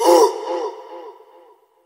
"ooh" male

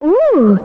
"ooh" female sexy; ooh ahh

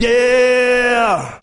"yeah" sung

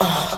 "ah"

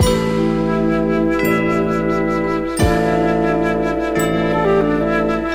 flute, Sad

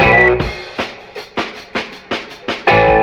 guitars included, Drum loops, Boombap

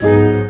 mixed, Piano

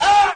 "ah", pain; ooh ahh

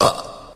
burp short

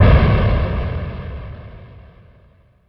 electric explosion; Crash Bang Boom

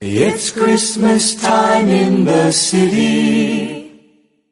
SUNG; xmas

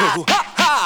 hoo hoo haha; ooh ahh; ho hoo woo

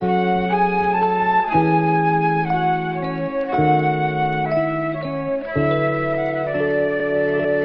flute wisdom born, Loops, Jazzmatazz

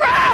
growl, Voice

